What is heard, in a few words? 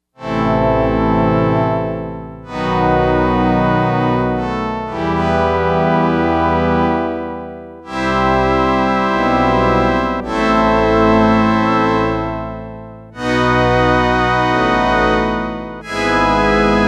Orchestra, Yamaha DX100; Factory Patches